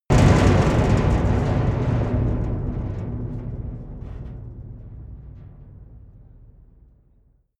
big metal impact rattle

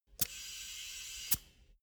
tire pressure control inflate short

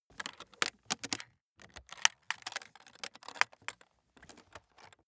aluminium case open buckle

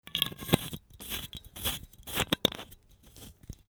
bottle close

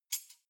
cutlery knives handle short

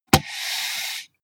computer mouse draw drop

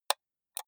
computer mouse left click single slow hard

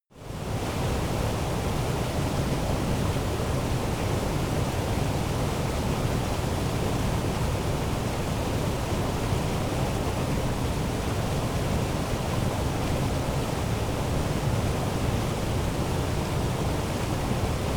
stream

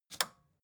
record player power push on

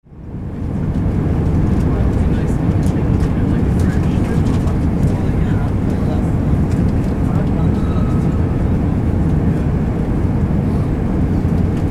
airplane cabin inside constant flight

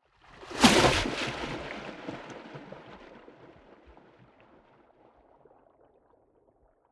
waves splash hard long

